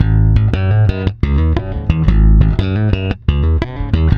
PWR A#, Bass, PWRSTRUM; Spectrasonics; Bass Legends; 128 PWRSTRUM

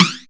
Flange Snares; Best Service ProSamples vol.37; Dance Synths; Percussion

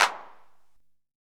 Clap, Drums; ZERO G - Datafile DISC 1

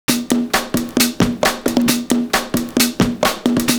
snr corner; Univers Sons - Kitch 70s

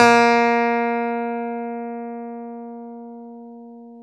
Clav DA A#4; Keyboards of The 60's and 70's

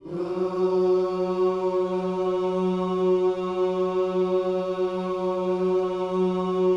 OOS F#, Vocal Gospel

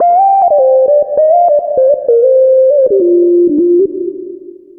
SYNTH LEAD; Keyboards; Leads

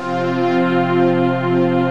PADY CHORD

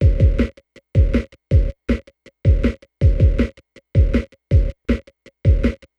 Noise Beat; Drums & Drones

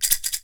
PEYOTE; AKAI S6000 CD-ROM - WorldSounds

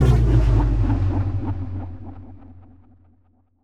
Impact; impact samples

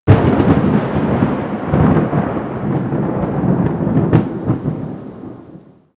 Nature Thunder; X-Static Goldmine

